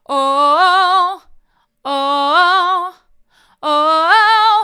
oh oh oh hard, vocal

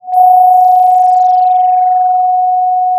Sound of a phone ringing

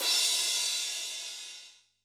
Crash, drum

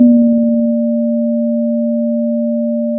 pop, Sound of a church bell

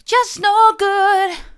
just no good soft, vocal

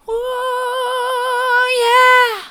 ooh yeah soft, vocal

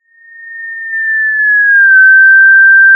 Sound of a police car siren

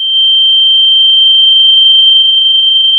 Sound of a tea kettle whistling

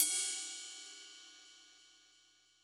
Ride; drum

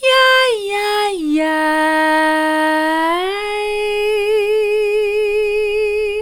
yi yi yi soft, vocal

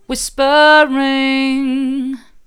whispering mid

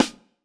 Snr, drum

